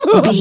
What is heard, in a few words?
voice female hurt pain